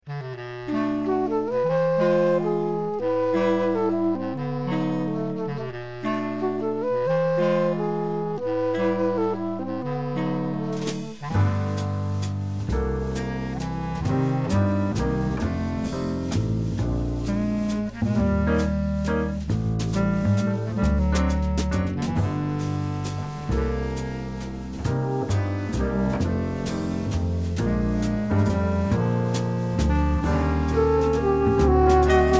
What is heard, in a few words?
minus melody